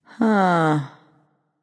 nv sigh; smite; awilix

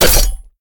Impact Small; smite; god bakasura

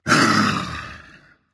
yay berserker; cuchulainn

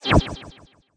staff fire; smite; ahpuch skin10

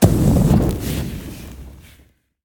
flameburst; smite; poseidon

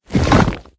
scoop; Smite; Cabrakan

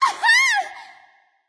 nv yay; smite; awilix

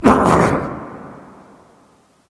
nv clear throat; smite; awilix

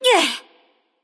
grunt attack; discordia

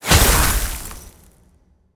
ice activate; smite; god merlin

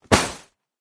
Landing Hit; Pajamas; smite; kumbhakarna

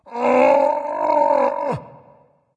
grunt lift dragon; fafnir

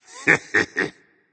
heh heh berserk; cuchulainn

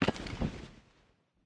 footstep; smite; chaac

